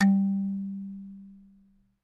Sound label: dark muted kalimba ui error sound